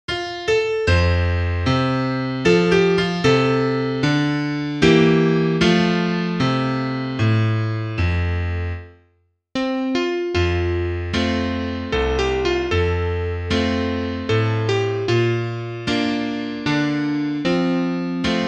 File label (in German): Klavier; amazing grace